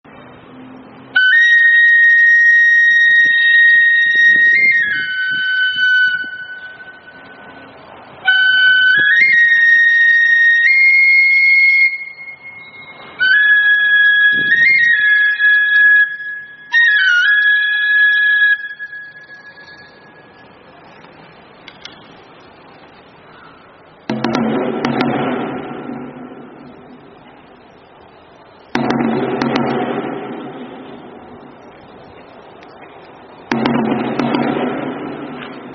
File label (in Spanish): Sonido, Llamado a la devocion de los fieles; Algunos Altares de Velacion y Huertos de 2026